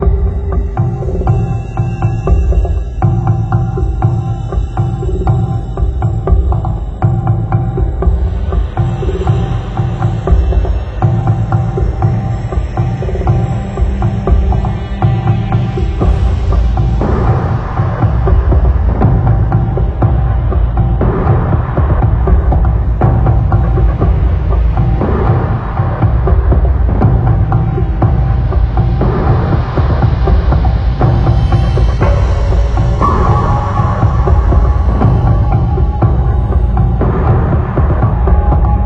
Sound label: Tension Loop